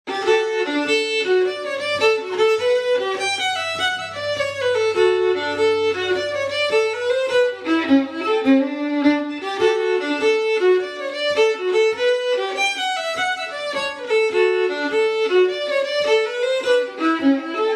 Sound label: Brookfield Jig, fiddle; Alyssas Jig